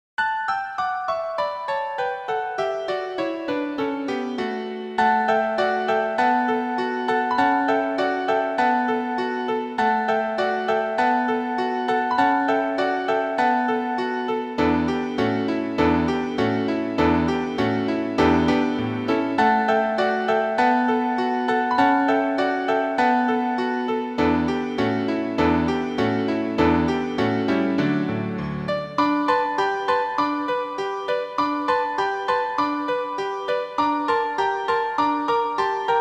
PIANO ACCOMPANIMENT, SA Accompaniment Track

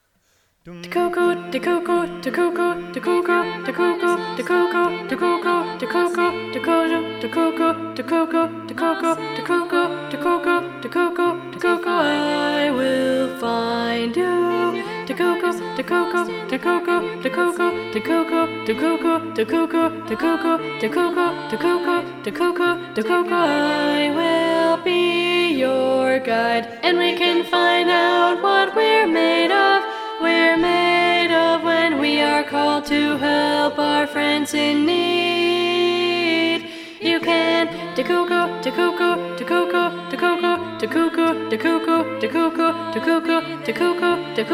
ALTO, SATB Alto Predominant

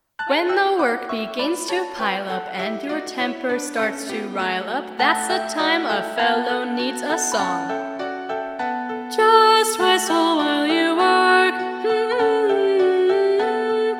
ALTO, SA Alto Predominant

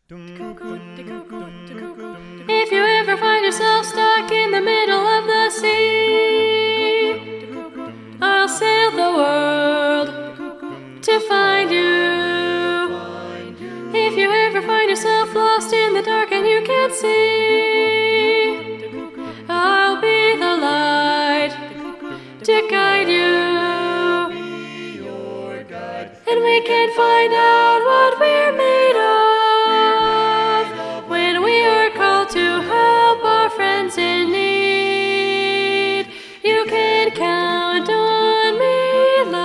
SOPRANO, SATB Soprano Predominant

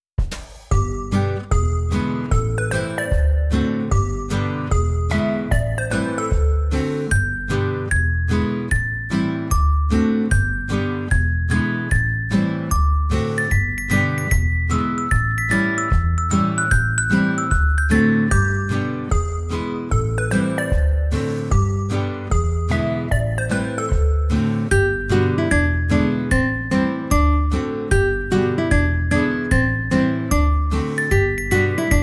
Mince Pies Instrumental

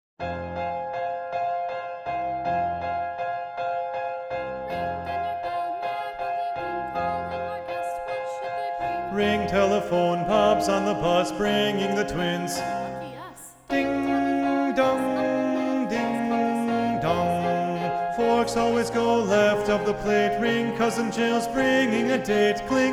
BARITONE 1, SATB Baritone 1 Predominant

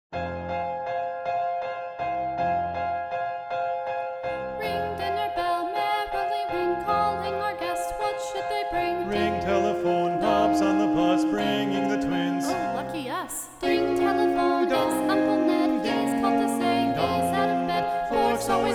SATB Balanced Voices

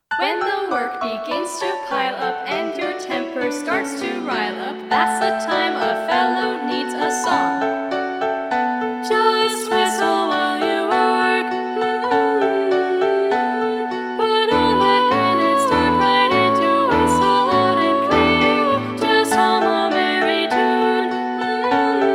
BALANCED SA Balanced Voices

